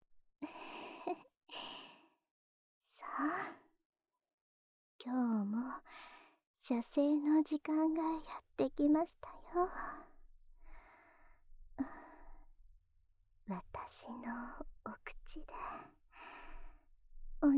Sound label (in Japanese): オナサポ用フェラチオループ, ASMR; 一週間、おまんこ係 コンプリートパック